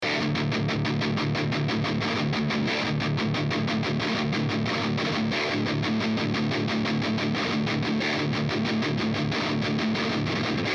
Bare Knuckle Pickups Aftermath JCM800

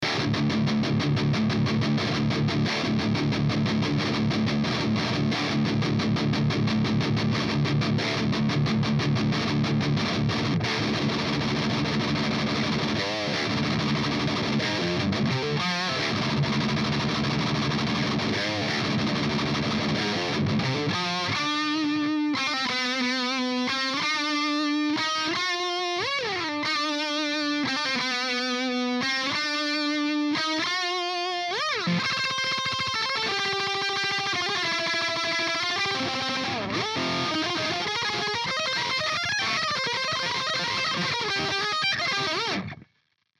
Pickups, Rectifier; Bare Knuckle Pickups; WarPig

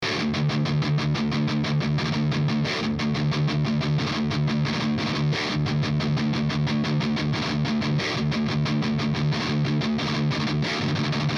Seymour Duncan TB4 JB Rectifier

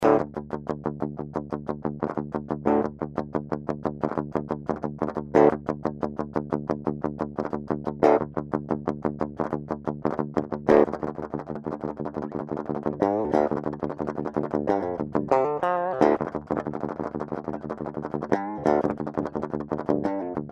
Fishman Fluence Modern active

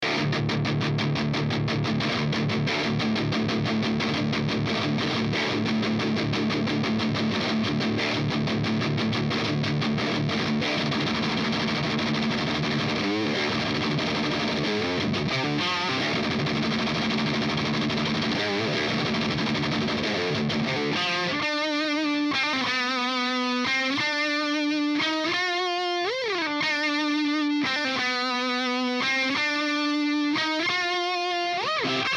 Scorpio JCM800; Zead

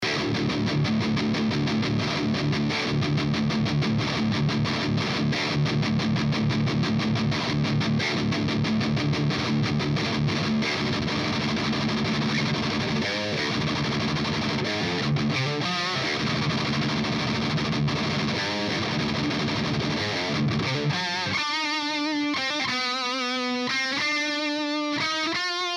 Bare Knuckle Pickups PainKiller Rectifier

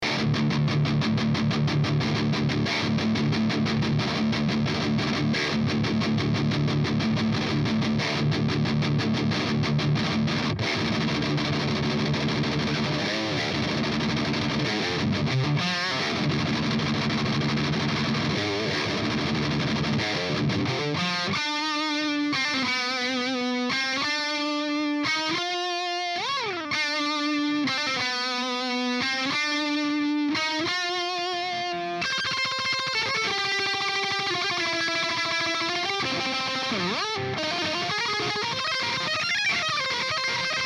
DiMarzio Imperium F Rectifier

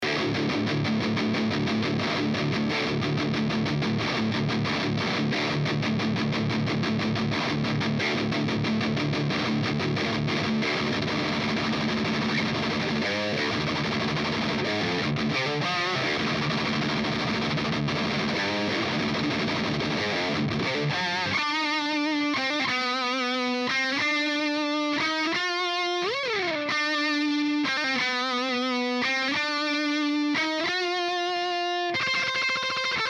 Pickups, JCM800; Bare Knuckle Pickups; PainKiller

